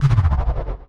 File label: Perc, drums; Touch Loops; BlissedOutBeats